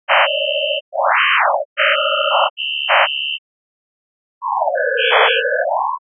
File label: cast in the sound